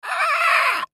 Monster Bird Squawk Dinosaur Creature; Horror; Animal; Monsters; Blastwave

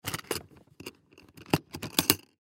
Toolbox Latch Unlock Double Plastic; Hand Tool; Hand Tools; Industry

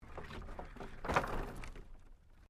Wood Planks Move

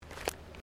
FOOTSTEPS THONGS (FLIPFLOPS) CONCRETE STEP; Footstep Foley; Human

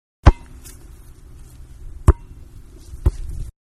Soccer Ball is kicked then bounces; Soccer (Sound Effects:Sports); Manhattan Production Music

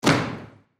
Human Crowd Studio; Crowd Reactions Stomp Single; Sound Effects:Voice

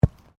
SOCCER BALL BOUNCE GRASS; Soccer ball sounds; Sports

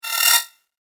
Bright But Nasty Whoosh

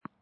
Tennis Ball Bouncing on Court Distant; Tennis (Sound Effects:Sports)

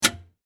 Industry Switch Flip Heavy; Factories

